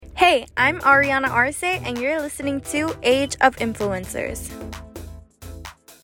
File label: Podcast Intro